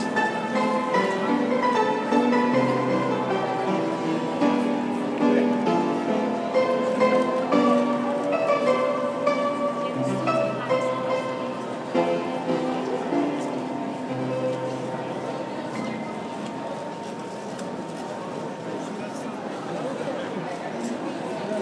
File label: st pancras piano